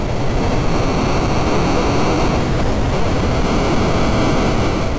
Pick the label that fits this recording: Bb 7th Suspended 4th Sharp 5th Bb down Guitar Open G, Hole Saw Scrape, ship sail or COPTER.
ship sail